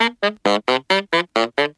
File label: sax; Phonky